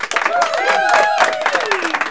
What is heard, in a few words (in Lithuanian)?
aplauze